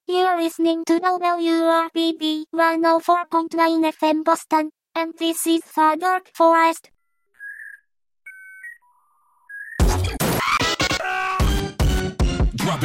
vocaloid

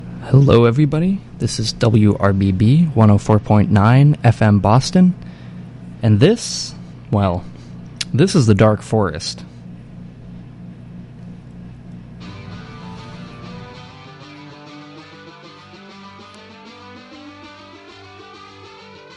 garage psych

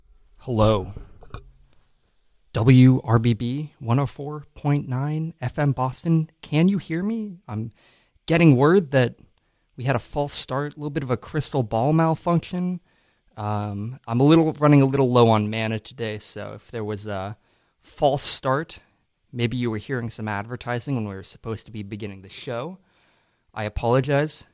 funk

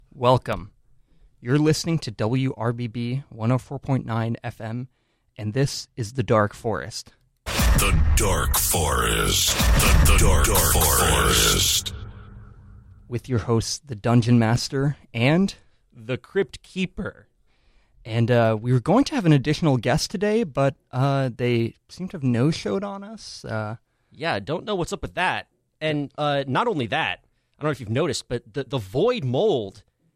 noise

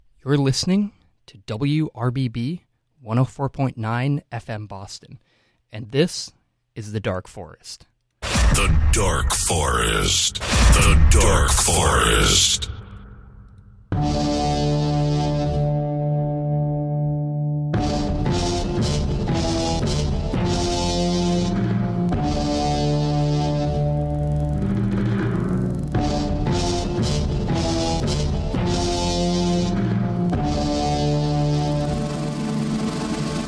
house

